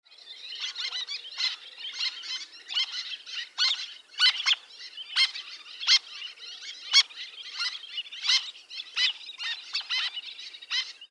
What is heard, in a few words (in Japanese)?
koajisashi